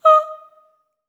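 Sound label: staccato D#5, soprano, Voices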